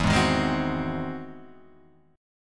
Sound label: E Augmented Major 9th, Guitar, Half Step Down